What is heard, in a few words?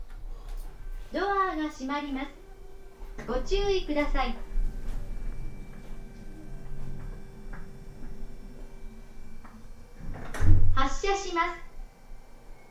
slope car